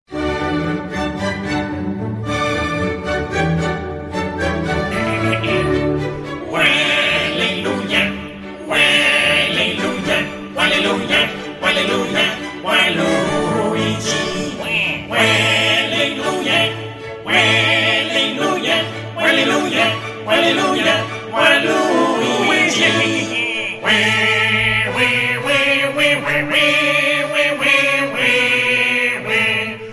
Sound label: Chorus; Wallelujah